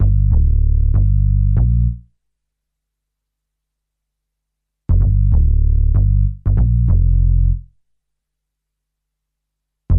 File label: HipHop Bass